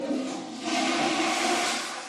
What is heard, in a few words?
flush; a piece of flake